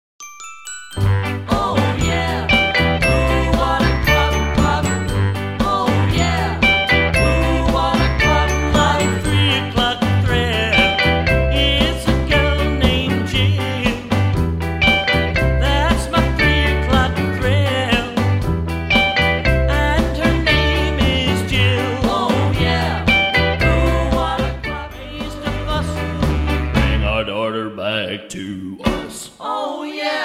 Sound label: three o clock thrill backing track sample